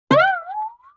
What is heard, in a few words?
Guitar Plink Slide